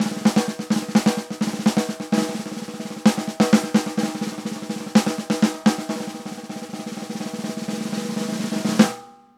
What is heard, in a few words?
Acoustic Drumloop